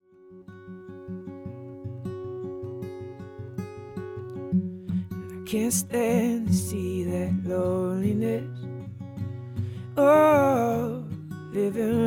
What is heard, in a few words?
Acoustic Singer Songwriter